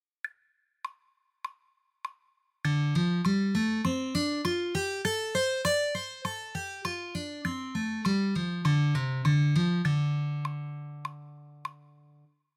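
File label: G minor form pentatonic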